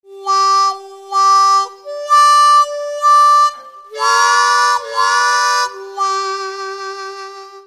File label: Effects, harmonica